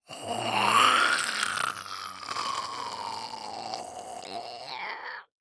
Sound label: Science Fiction Sci-Fi Alien Growl